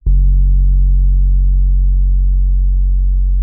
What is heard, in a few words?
F#1, RHODES; Xsample VOL-1